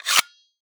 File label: war ui source metal staple gun slider snap closed